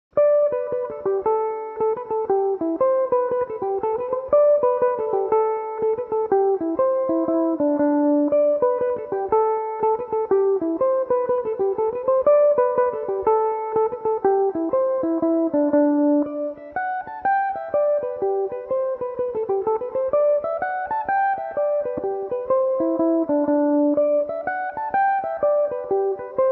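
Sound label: D mixolydian, irish mandolin, tunes; Belmuse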